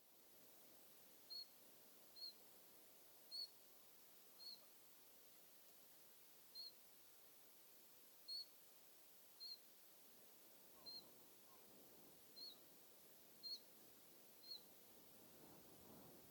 Common Chiffchaff - Phylloscopus collybita tristis; Arter